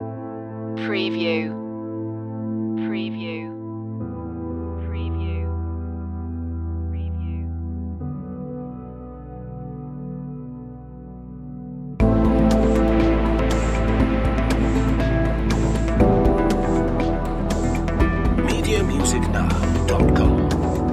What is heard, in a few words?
calm shores